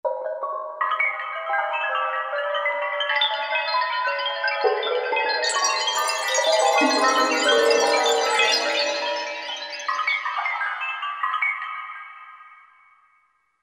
ShellBurst, Korg MSC-10S Ethnic

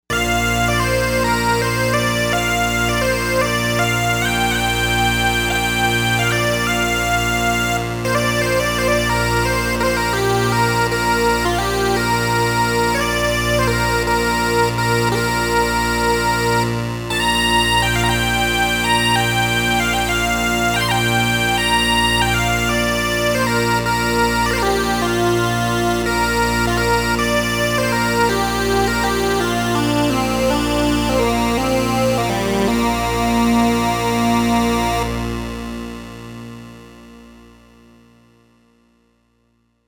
Scottish Synth, Novation UltraNova; Factory Patches

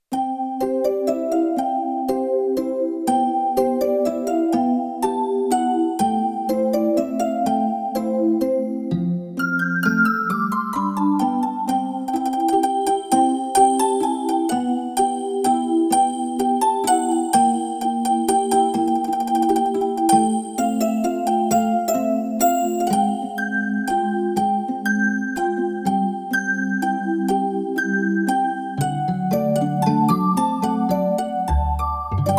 Roland JD-800; Factory Patches

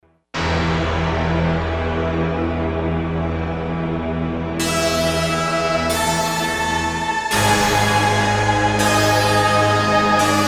Rough Stack

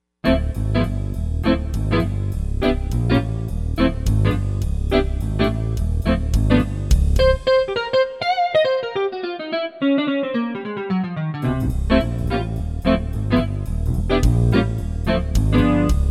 Jazz Band

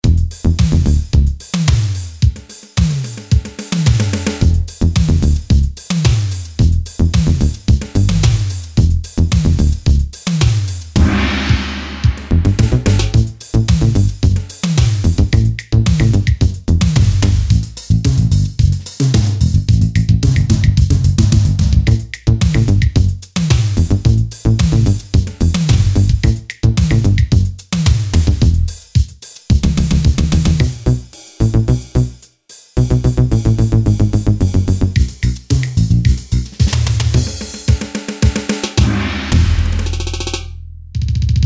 ELEC ROCK, Boss DR-3

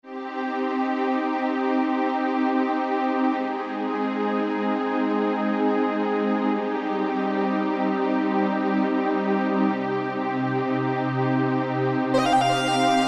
Lead & Pad, Korg 05RW

